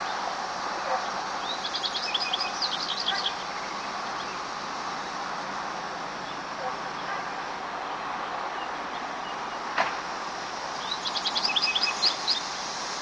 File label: bird